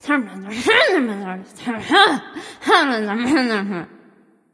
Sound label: grumble; smite; sol